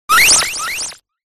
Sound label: sound design glitch lo fi